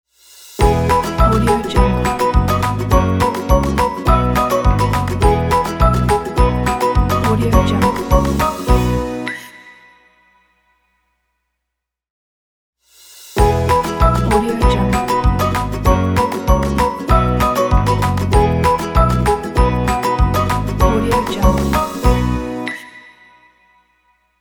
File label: upbeat happy kids logo